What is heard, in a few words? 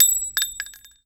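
shell bounce small, weapons, shells; ModernWarfare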